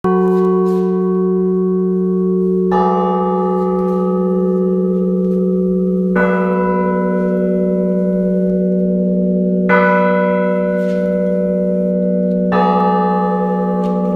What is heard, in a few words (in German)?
Glockendreiklang; Nr. 6 Petersstrasse; Bieberstein